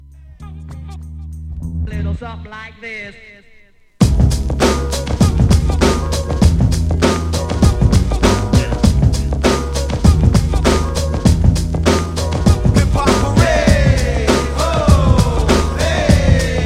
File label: naughty hiphop uk